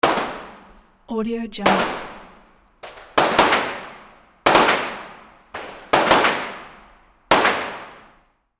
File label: indoor gun range with various shots; game